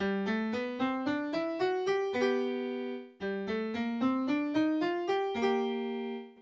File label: parallel G, piano